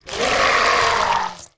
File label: Swamp Leech Death